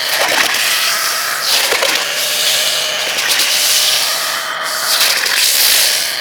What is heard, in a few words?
Secondary Barrel Hit Loop, Weapons; Warframe; SU Modular Guns